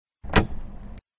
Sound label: open door; Sound design for the opera composer: concepts and methods; Vicky and Albert audio cues